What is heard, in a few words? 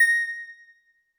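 Jazz Harp Kawaii A#4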